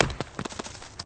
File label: smack roll longer